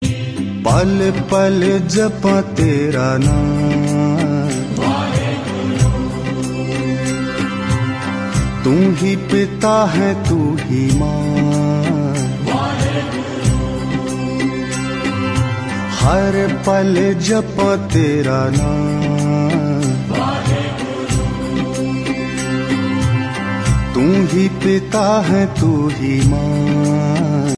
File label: punjabi devotional song